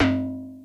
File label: JAP PLAY-HI, FM PERCS; Crypton - DrumBase5000